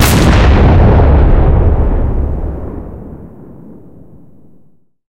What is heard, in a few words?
cannon 125mm 2a46 shot, cannons tanks